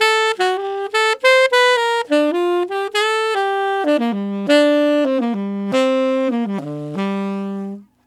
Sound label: Tenor Swing in G Min, Tenor Sax Loops; Sonic Foundry (Sony Creative Software); Jazz Solos and Sections